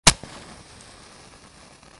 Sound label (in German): streichholz anzuenden